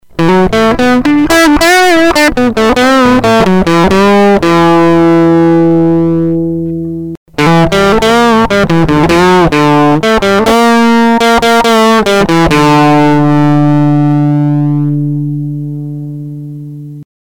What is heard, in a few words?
guitar